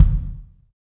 KIK, Real Kiks; Club-50 - Foundations Roland

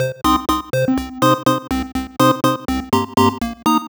Synth, 123bpm, Nu Dance Electro; Zero-G; Soundclash; Acidized Wavs; Combi Loops

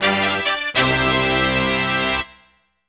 fanfare; AKAI S6000 CD-ROM - Volume 6

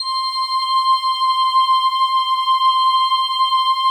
PAD, DIGI-PADS

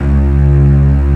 STRINGS C#1, Analog, Synth; E-MU Formula 4000 Series Vol. 3; Analog Odyssey; Synth Builder

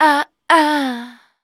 VOC F, Dance Vocals, FEMALE

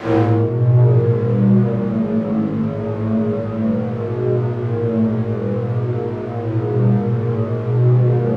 SWEEP LO, Yamaha VP-1, SWEEPER; Music Store

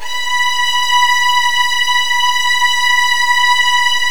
B3, Virtuoso Strings

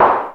Roland noise; Drums

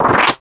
SFX, Drum & Bass, 160 BPM; Ueberschall; Drum & Bass Resonance

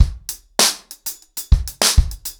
100BPM, ACOUSTIC DRUMS

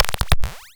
Silver BBD Zap Mod, drum machine; 300 Drum Machines; DevSnd

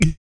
VOC PERC, Vocal Planet Jazz FX BASS PERC

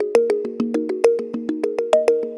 Tongue Mallet Echo; Melodic; Ambient-Melodics-II

